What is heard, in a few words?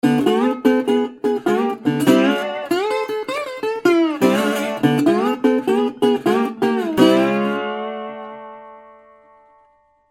National Shuffle